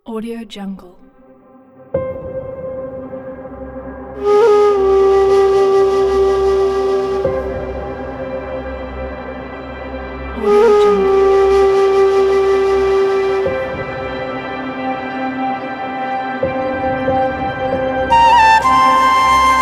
indian nature

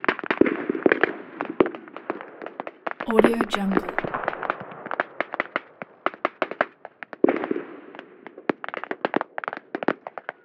battle world war ii

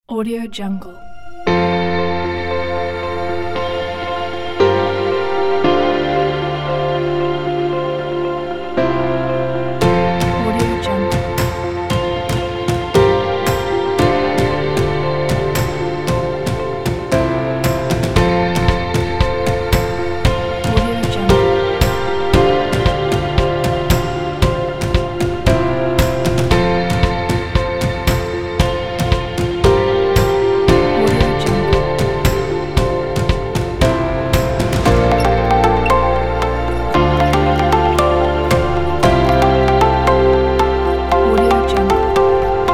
the upbeat corporate

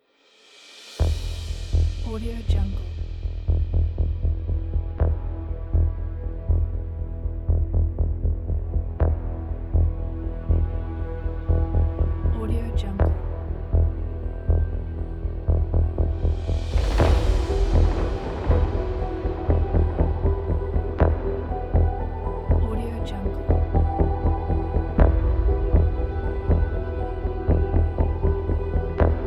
tension suspense background

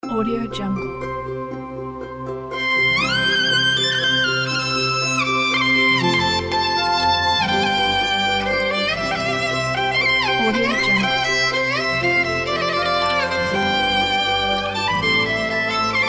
celtic lyrics

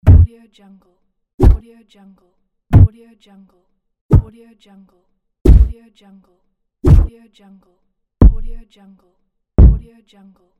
thud

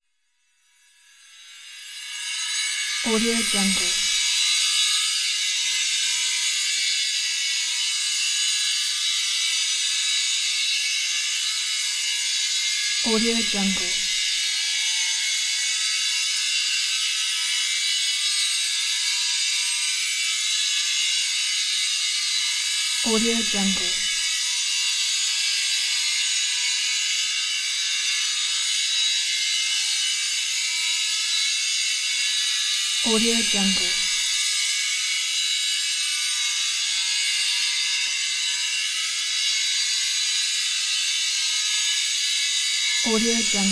swirling wet tone constant shimmering ringing

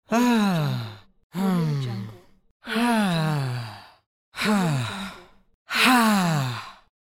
teenage boy sighing pack

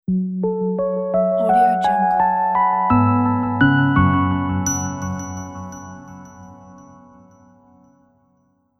ambient piano logo

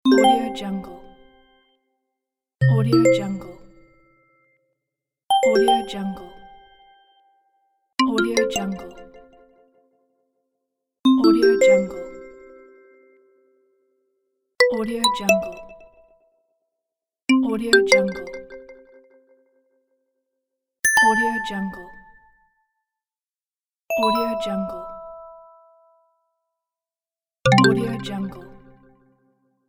menu button sounds

